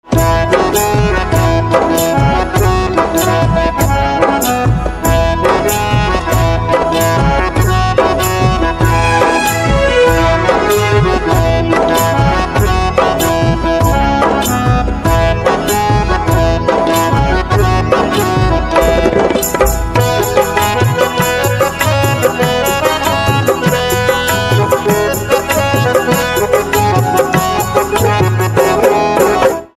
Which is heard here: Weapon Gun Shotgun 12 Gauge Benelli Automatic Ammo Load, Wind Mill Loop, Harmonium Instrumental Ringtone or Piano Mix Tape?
Harmonium Instrumental Ringtone